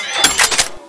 missile shot last; tfa cso; magnum lancer

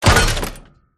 gunstand drop; gow; turret judgement

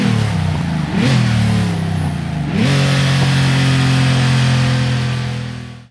throttle off, vehicles cars; mer g65; lwcars

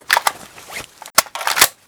reload rifle

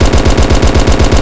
falcon mg

